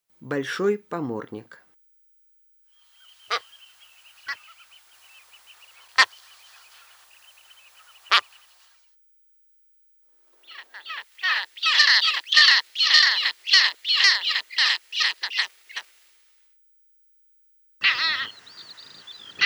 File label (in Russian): bolshoy pomornik golos